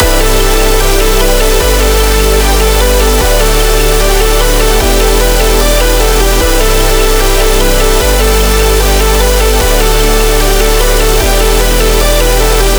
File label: synth loop G Maj 150; Favorite; base pack